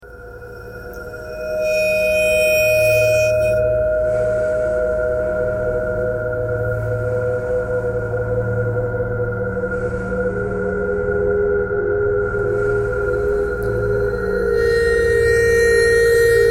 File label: ice bowed vibes traffic k core birds thread simple; bowed metal